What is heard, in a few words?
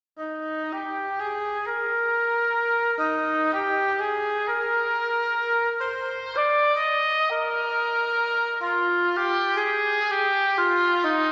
Woodwinds Only